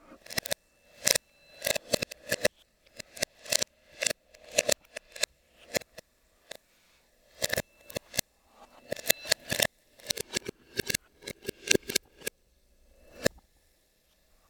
Time Travel; Back To The Future

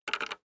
Button

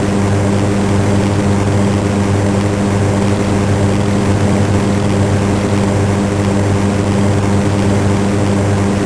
Generic Prop Low

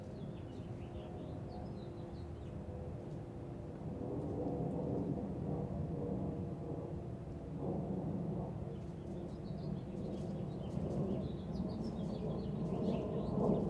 Environment

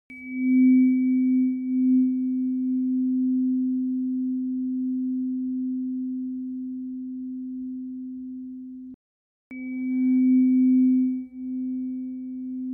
Tuning Fork Waves

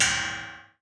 Metal Clang

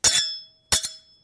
Long Knife Blade

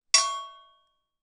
Anvil Strike

